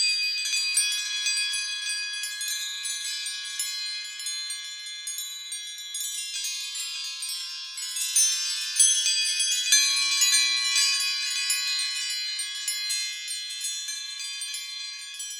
Musical, Chime, Wind Chimes, Small, Gentle, Metalic, Synthesize; B Path of the Teal Lotus